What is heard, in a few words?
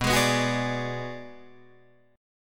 B Minor 6th B Guitar Drop D, chord